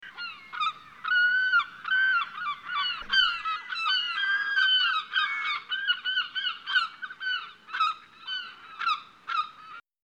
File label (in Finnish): lokkeja